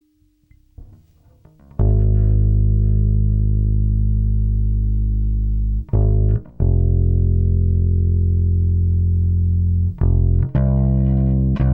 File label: bass sc 50